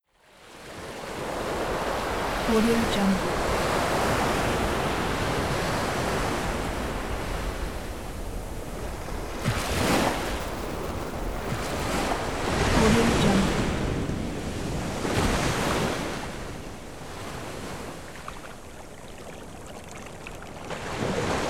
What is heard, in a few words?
beach sunny day